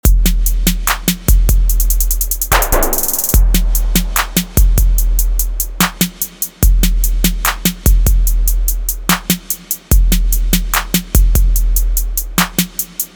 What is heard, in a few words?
DRUMS WITH HATS